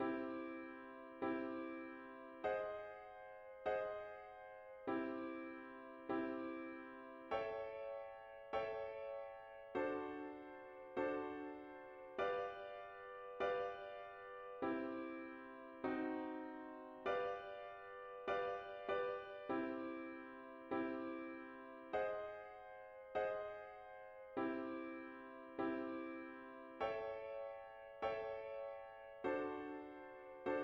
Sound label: Sequence Diatonic Chords; Improvisation